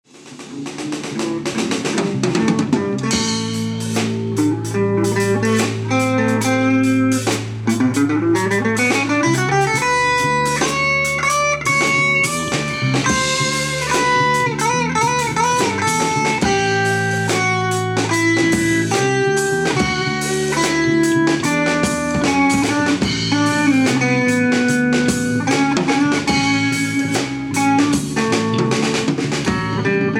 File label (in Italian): Improvvisazione